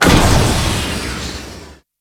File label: Fortress Cannon Movement Stop; Ambience; Warframe; Grineer Fortress